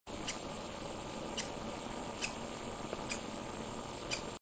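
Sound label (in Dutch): jong 1 dag, agaporniden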